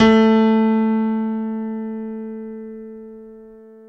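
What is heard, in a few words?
dance piano A2; Best Service Advanced Media Trax Modern Composer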